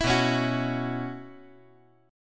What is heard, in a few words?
B Suspended 2nd Suspended 4th